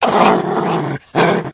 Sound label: eat, pseudodog